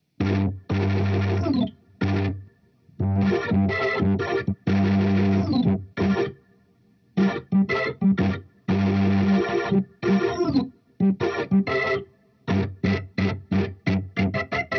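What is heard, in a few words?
amp organ, Hybrid neural guitar overdrive; honeybee; Morphdrive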